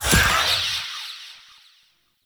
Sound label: Wraith Throw Loop End; Enemies; Warframe; Zariman